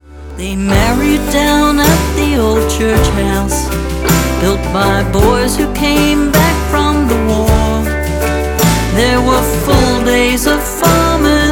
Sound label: waltz; Coolatai